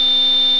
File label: CARDIAC, ALARMS